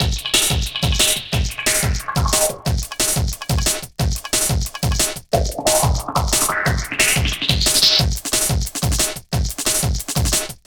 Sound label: Victory Beat, Drum & Bass; Fluid Dynamics